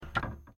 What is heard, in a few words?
Closet Close